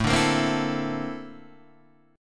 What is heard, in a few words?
Bb Major Sharp 11th A, chord